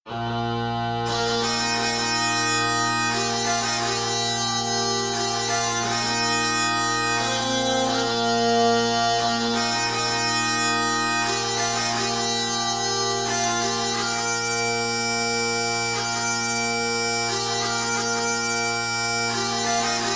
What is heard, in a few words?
Reverb